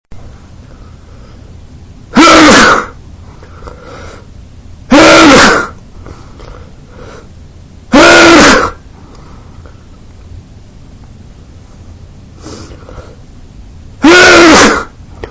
harsh sneezing fit, male

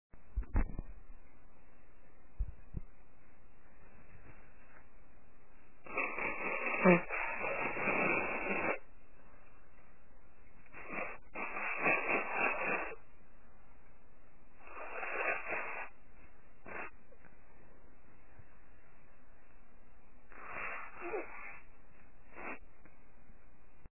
medium blow, female

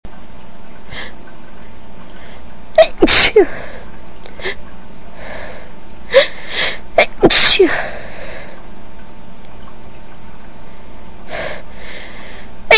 half stifles, female

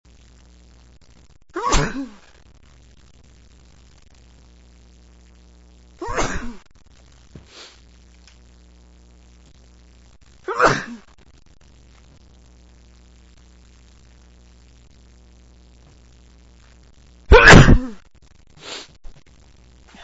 cold, male

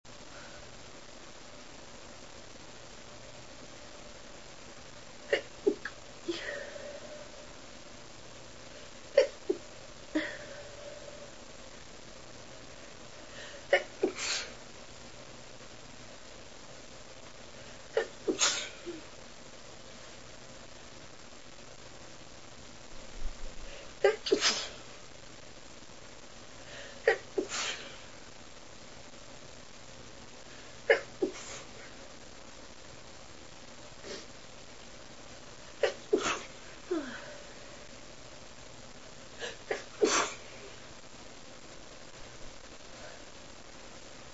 late night sneezes, female

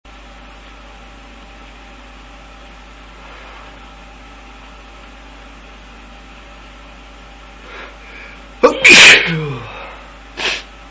cold, male

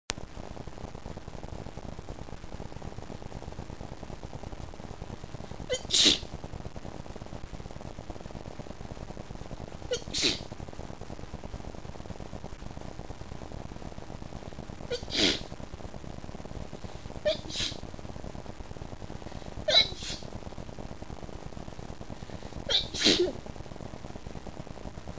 shy sneeze, female

